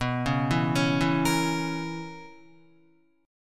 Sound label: B Major 7th Suspended 2nd Suspended 4th B Guitar; 6,4,4,6,4,6; arpeggiated; JGuitar